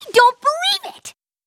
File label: fail; vs ponyville